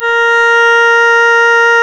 Synclav FM, WIND, A#; Big Fish Audio

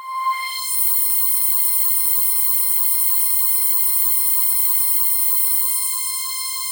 PAN BURST C5; Best Service; Advanced Media Trax Modern Composer; TRANCE 2

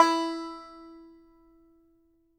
banjo E4, banjo notes, country; Sampleheads - Whole Lotta Country 1 & 2

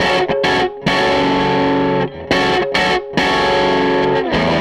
SG Guitar Loop

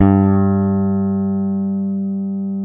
P BASS SUSTN F G2; Sampleheads; Will Lee Bass Library

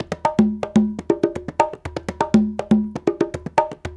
African Contemporary, Mozambique; Spectrasonics; Supreme Beats

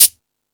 Percussion, E-MU Pro-Cussion

